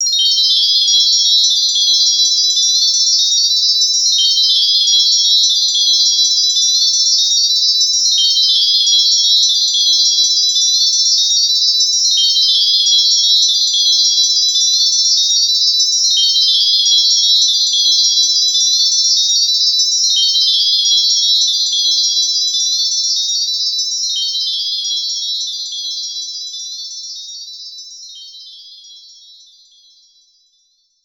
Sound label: Magic Jingle